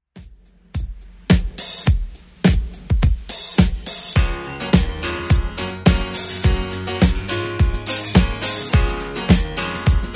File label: 70s Redrum 105bpm